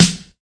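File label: classic hip hop Snare; Classic Hip Hop Mega Kit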